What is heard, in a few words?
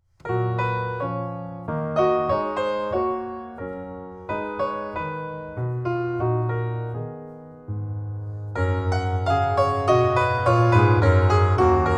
Prelude in G Flat Major